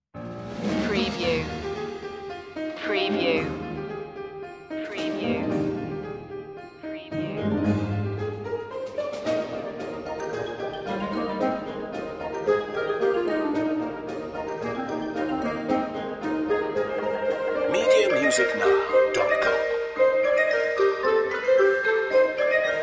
toys marching

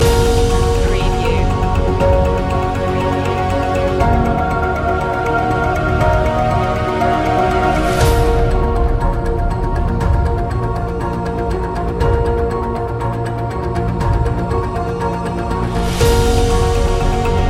villain loop

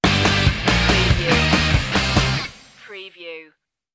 hair metal duke stinger

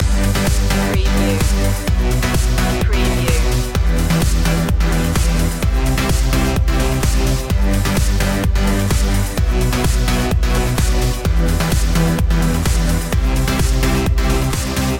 trance loop

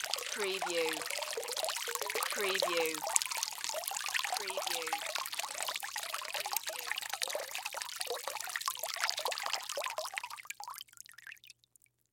Water Pour Into Bath; Sound Effects Bible

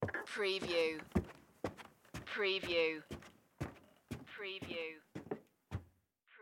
Footstep Stairs Carpet

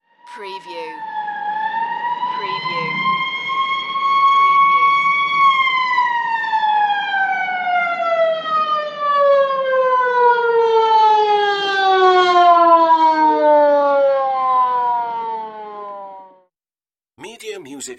Police Siren Approach Fade

